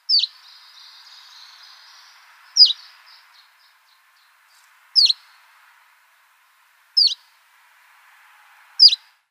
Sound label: Canary Islands Chiffchaff calls